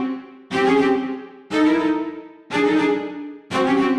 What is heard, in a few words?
Cellos 120-C, horrorscape samples 120bpm